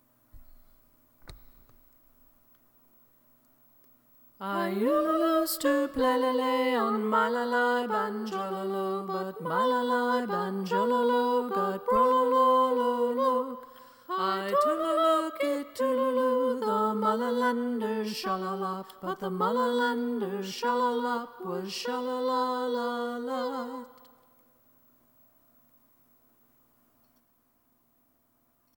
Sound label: Banjo Song Duet